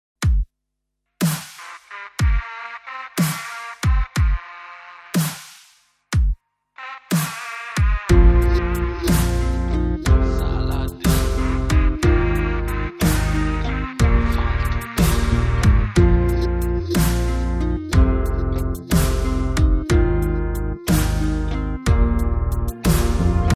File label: Drum Beats